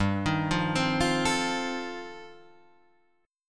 F 7th G, F7/G chord; F7/G arpeggiated

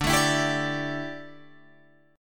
D Suspended 2nd Suspended 4th, Guitar, Open G

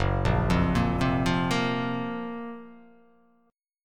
Gb Major 7th Flat 5th Gb; arpeggiated; 2,1,3,3,0,0,0,x; JGuitar

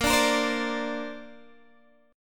Bb Major Add 9th, Guitar, Open D; 20,17,20,18,17,x

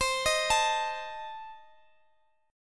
C Minor 6th, C, down, Guitar, Standard; Cm6; arpeggiated; capo=9

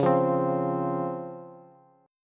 Db Major 7th, Bass; strummed; chord shape; JGuitar

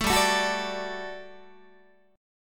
G Major 7th Suspended 2nd, down, Guitar; GM7sus2 strummed

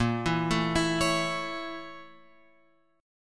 A sharp Minor Major 7th Flat 5th A sharp; A#mM7b5; {6 7 7 9 X 9} arpeggiated

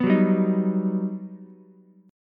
G Augmented Major 7th, Ukulele; G+M7 strummed; JGuitar

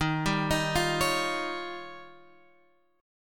D sharp 9th D sharp, Guitar Open F; D#9 arpeggiated; JGuitar

